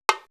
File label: Bongo; Coldcut's Kleptomania VOL1